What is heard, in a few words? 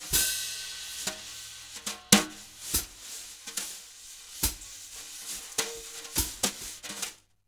BALLAD, Jazz Latin Drumloops; USB Soundscan vol.08; AKAI